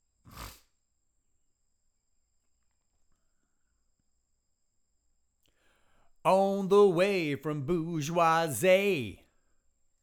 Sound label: vocals